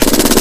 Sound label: mg-3 fire continous